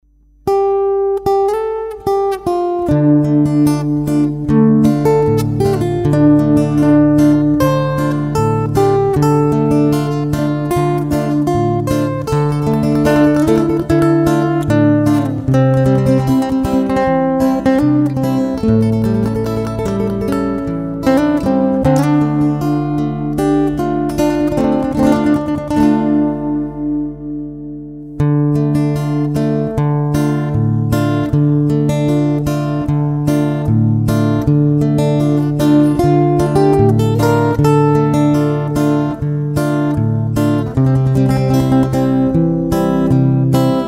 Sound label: beat; nhac khong loi nhac thien